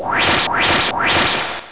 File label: DIE